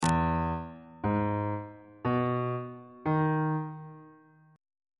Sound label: b flat locrian 7th chord 5 is f flat major 7th on bass clef